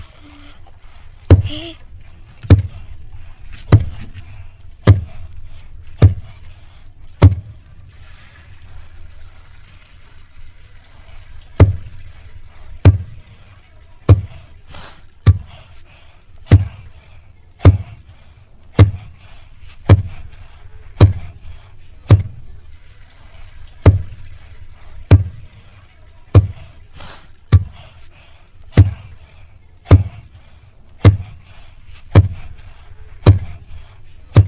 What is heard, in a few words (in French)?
Mortier cuisiniere bass, touareg camp, North Niger